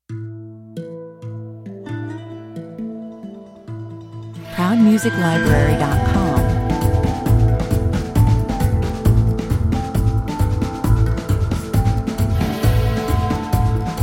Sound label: samba, 134 bpm